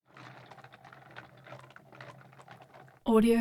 skateboard various starts rolls

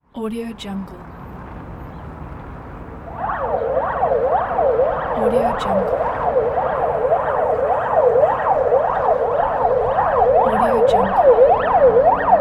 ambulance siren in city traffic

